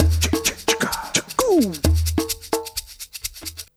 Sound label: HOT PERC, Roots Disco; USB Soundscan vol.32; AKAI